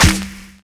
ZULU CLAP; Univers Sons - Kitch 70s

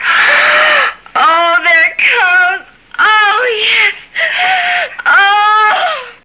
SEXVOX; X-Static Goldmine 1

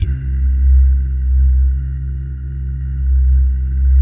BS DOO, Vocal Planet Jazz FX MIX GUYGAL; Spectrasonics

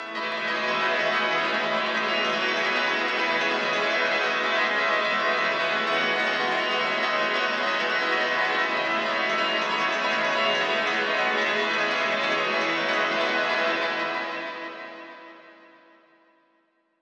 aggressive pad; isekai; ambient or nature

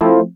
wurlitzer, dx7

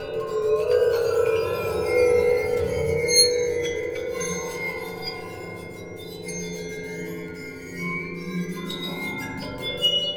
Waterphone Bounce Bow; Metal; Sonic Foundry (Sony Creative Software) - Ambient Atmospheres & Rhythms; Ambient-Metals

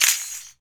RAKATA, Afro Shakers, Ankle Pods; Roland LCDP14 Africa VOL-2

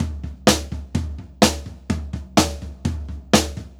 126 POP TOM, Drumloops; USB Soundscan vol.37; AKAI; Pop-Rock

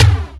FX kick; E-MU Formula 4000 Series Vol. 2; OrbitPercMaster

